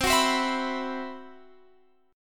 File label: C Major 7th Flat 5th, Guitar Open F, chord; CM7b5; strummed; x,19,18,19,19,18